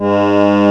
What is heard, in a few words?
ACC C#3, FOLKLORIK; East West - Global Sounds